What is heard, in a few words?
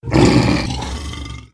deathclaw idle; slender